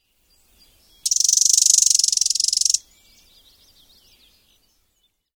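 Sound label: common yellowthroat agressive chatter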